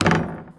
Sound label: Street Ball Glass Back